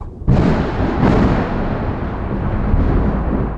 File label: THUNDER; Best Service - XXL Production Effects